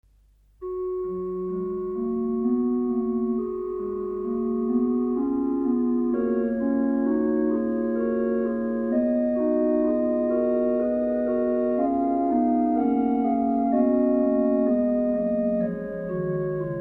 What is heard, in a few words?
Organ; Kyrie(little versions)-Kyrie, Gott Vater; Mass for Organ